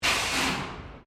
Flame Gas Shutdown, Giant industrial gas flame jet; Flames; Sound Effects:Objects; Foundation3